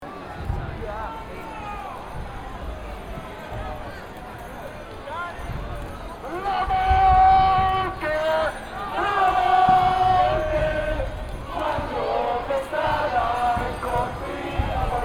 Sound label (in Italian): Coro